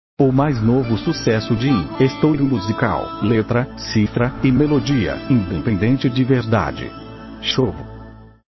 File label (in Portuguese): música de forró abertura em comercial